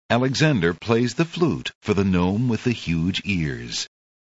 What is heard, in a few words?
flute